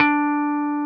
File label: Jazz Guitar